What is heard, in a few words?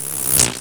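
bluebottle, Anime Sound FX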